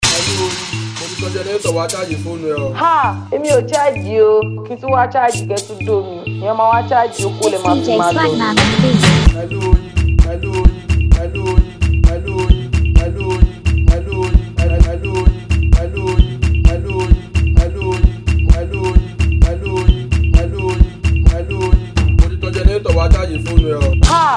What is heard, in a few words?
Cruise Beat